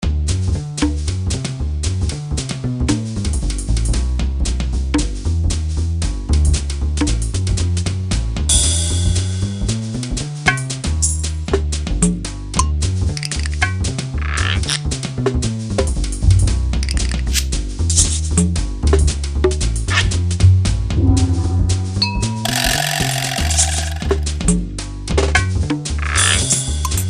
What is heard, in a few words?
Menu jazz